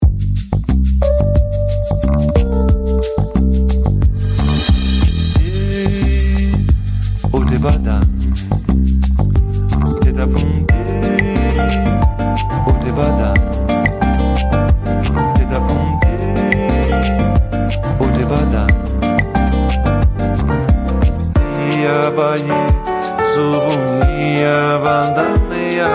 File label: chill latino